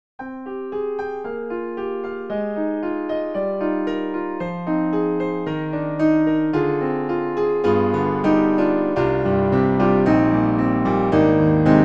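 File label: Jazzy Warm Grand, piano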